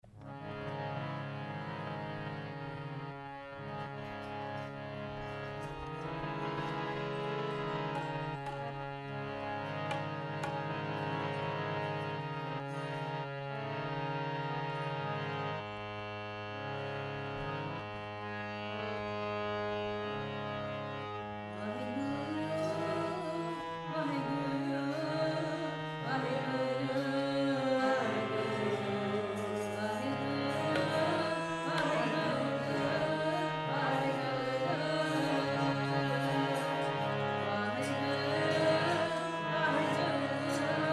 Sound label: Keertan, Rainsbhaee; Bebe Nanaki